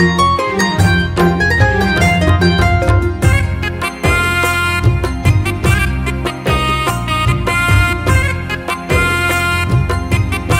telugu christian ringtone, christian gospel ringtones